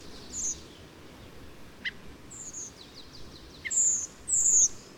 redw iliacus FL FC SC; terpitz

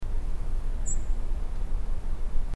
erobin rubecula FC; reudnitz

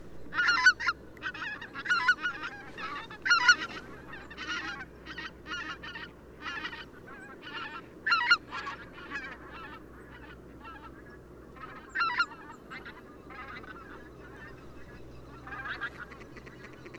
gwfgoo albifrons AD FC SQUEAL FC HONK

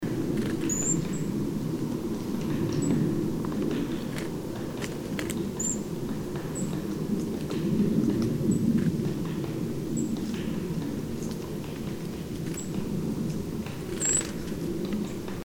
ectit mitratus FC; reudnitz

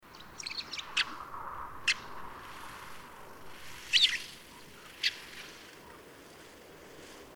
montanus; etsparr; grossbuch

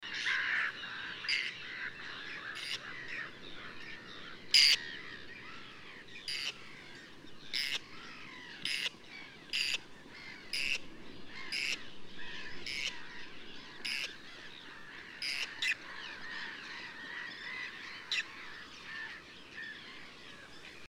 whisktern hybrida AD CKRRK long CKRRK short; gr-rosin

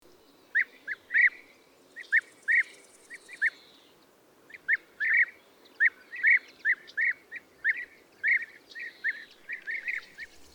ebeater SEV AD FC; wallend

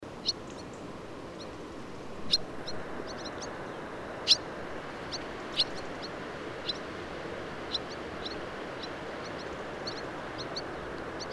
bswall rustica FL AD JUV FC HUN; krienke

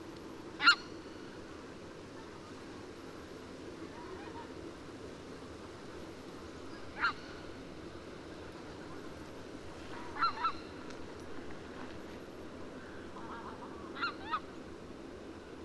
albifrons

